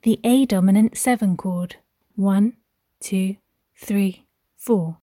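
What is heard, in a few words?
A dominant 7th chord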